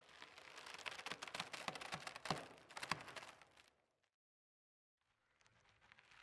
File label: rope